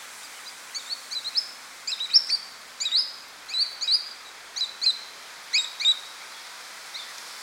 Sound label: Green Sandpiper, Waldwasserläufer